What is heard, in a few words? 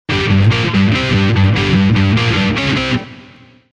rock